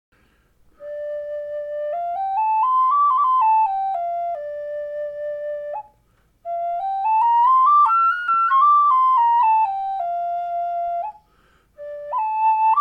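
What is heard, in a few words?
D5 Walnut bird, Native Style Flutes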